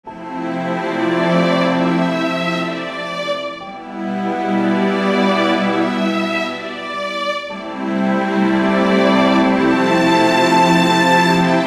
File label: Reflective